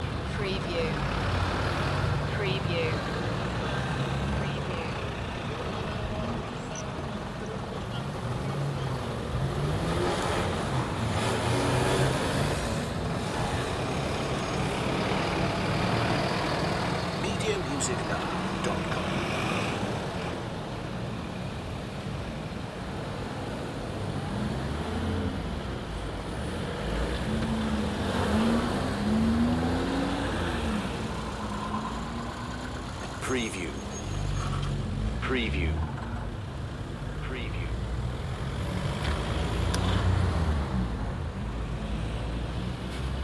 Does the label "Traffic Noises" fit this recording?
Yes